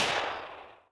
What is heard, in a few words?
Uzi Stop; Playable Tutorial Level